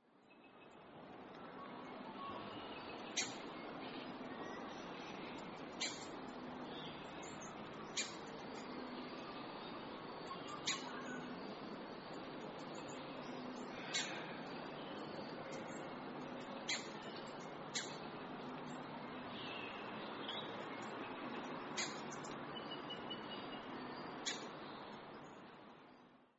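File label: New Holland Honeyeater chez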